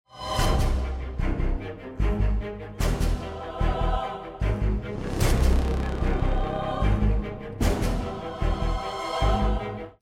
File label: start action; Outlast